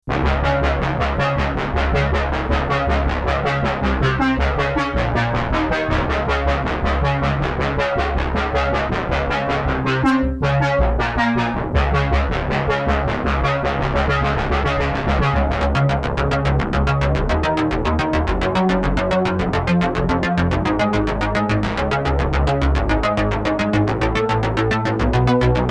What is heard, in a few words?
A6 Polysix-like sound, Alesis A6